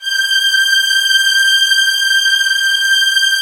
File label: LDFV G6, LOUD VIOLINS; Q Up Arts; Denny Jaeger Master Violin Library